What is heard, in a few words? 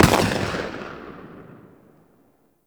Weapon Enemy Tail Outdoor; Weapon Enemy Tail Layers; Warframe; Weapons